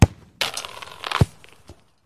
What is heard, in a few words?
Soccer ball kick into net penalty